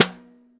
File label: Snare Bottom